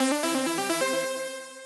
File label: WIN; Poupi's incredible adventures !